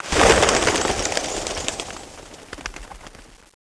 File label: bats